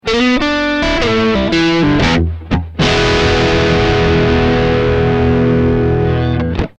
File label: dirty neck coil; smoke stack ii; axetec